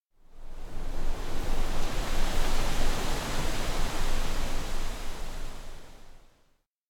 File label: wind; anemoi; SE wind spica